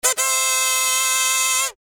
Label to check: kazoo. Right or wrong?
Right